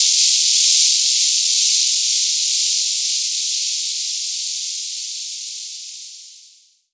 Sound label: Downsweeps